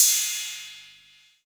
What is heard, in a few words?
Ride, Drum & Bass, Hats & Rides; AMG - Prototype Drum & Bass